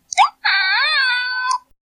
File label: Wiimote Sound; Kirby